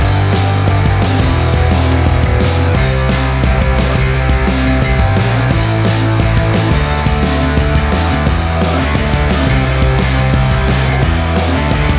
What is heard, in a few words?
Big and Bold Pop Punk Rock Music